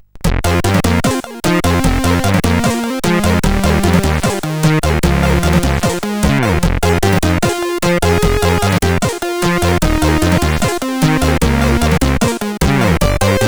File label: sid CSG8580; attityd